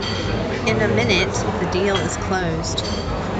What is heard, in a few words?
mixed noisy